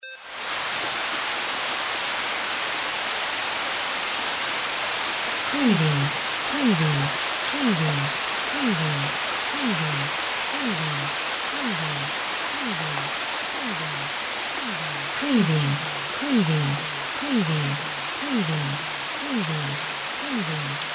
RAIN DECREASE; windy beach audio

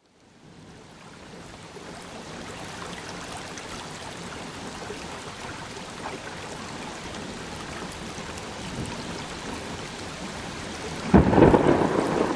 Thunderstorm; Relaxing with Nature

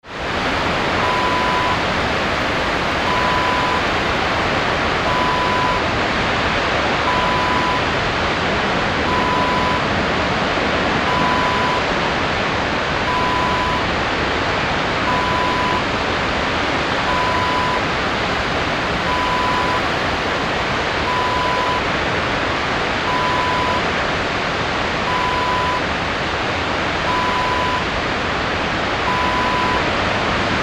waterfall

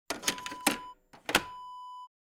cassette in close

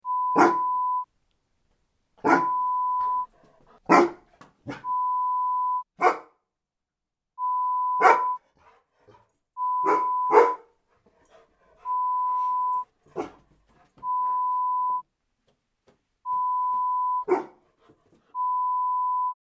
Dog Barking sound

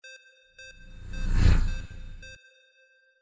SCIFI SPACESHIP PASSBY; windy beach audio

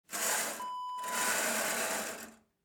curtain pull

